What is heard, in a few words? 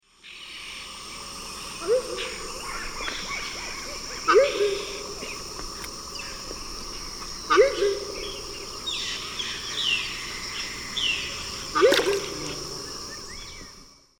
Anhima cornuta, calls; S. America; Eastern Lowlands